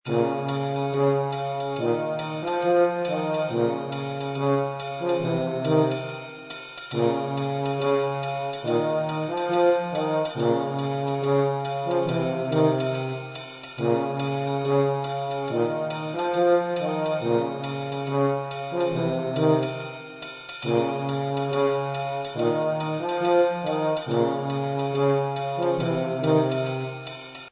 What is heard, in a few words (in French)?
trombone tuba voix